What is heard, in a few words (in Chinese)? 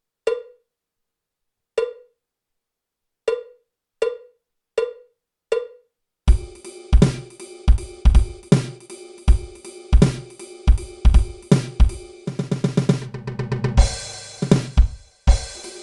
架子鼓独奏 慢切分; 66条架子鼓独奏SOLO